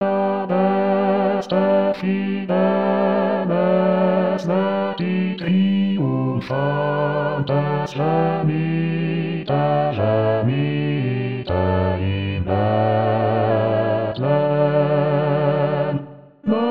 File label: Bas; Adeste fideles